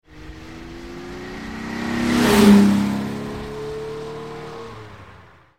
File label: ferrari 275